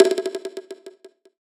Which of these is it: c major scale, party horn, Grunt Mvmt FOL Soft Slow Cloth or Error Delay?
Error Delay